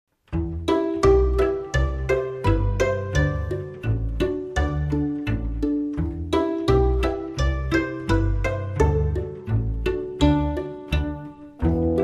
Weisswurst Downtown, Less Percussion

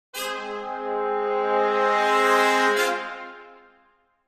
Brass Crescendo Sting

